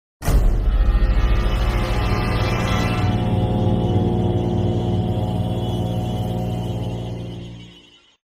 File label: Combat Stinger; Alice: Madness Returns unreleased original soundtrack